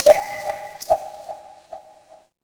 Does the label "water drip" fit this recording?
Yes